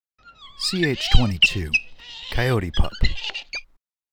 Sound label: Coyote Pup Distress